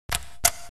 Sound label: button; Gravity; camera